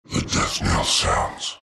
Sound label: shards death; terrorblade